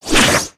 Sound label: Swing; Little Friend